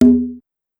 Conga Hi Mute, Drum Machines, Clavia DDrum AT, Pitched Up; 300 Drum Machines